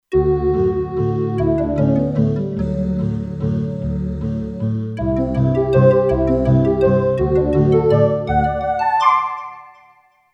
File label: Tranquil Pluck, Kurzweil K2500X; Factory Programs